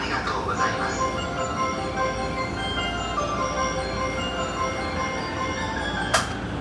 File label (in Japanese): 中央・総武線新検見川西, train sounds